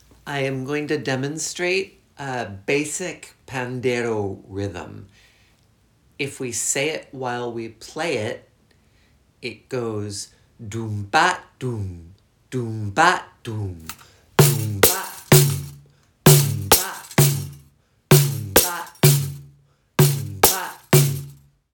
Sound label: Pandeiro basic demonstration; Click below to listen to me demonstrating this basic pandeiro rhythm; Dum BAH Dum; Capoeira Music Practice Material